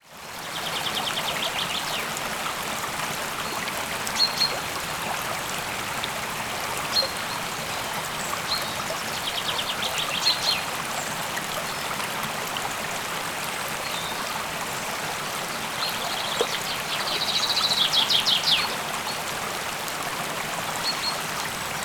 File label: sound water flowing and birds